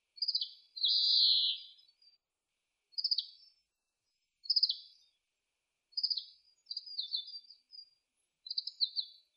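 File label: kawarahiwa song, oriental greenfinch; saezuri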